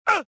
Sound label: pain; rebel